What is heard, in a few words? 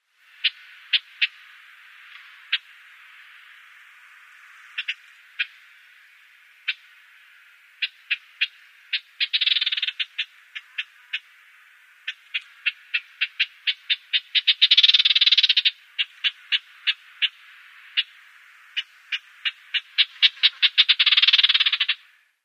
Arabian Warbler calls